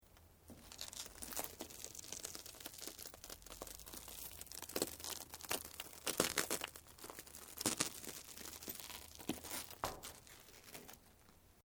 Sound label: GLASS LAMINATED TWISTING; Material components for constructing more complex sounds; Materials